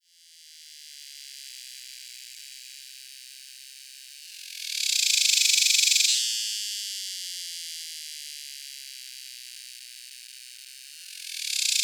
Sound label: Neotibicen superbus two phrases, cicadas, filtered; TX